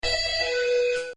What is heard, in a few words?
siren; popcap; bejeweled